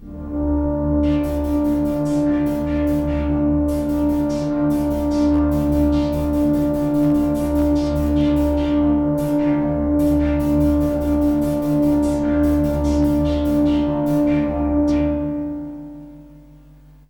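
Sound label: RHYTHM PAD, AMBIENT PAD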